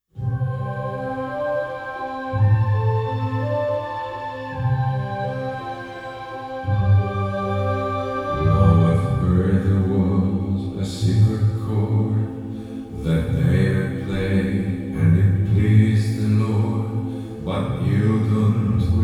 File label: Hallelujah